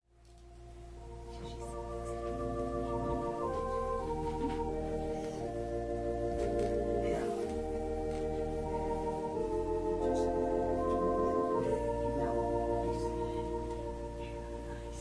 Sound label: Organ and violin prelude